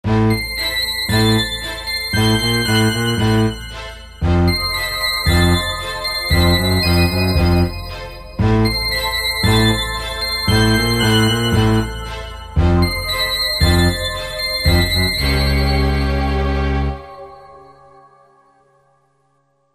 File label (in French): suspense; inter reve esteban